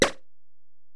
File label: EPerc, Alesis DM-PRO; 300 Drum Machines